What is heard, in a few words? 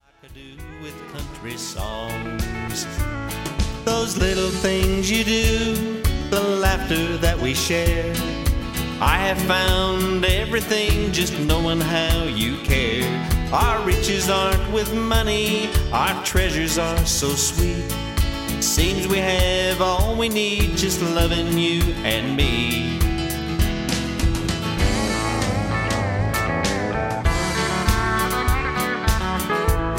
Country Songs